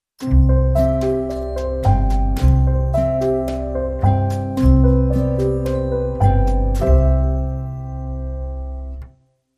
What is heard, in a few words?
Alpine Romantic, Sting